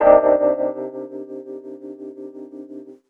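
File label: keyboard, Roots Funk; USB Soundscan vol.41; AKAI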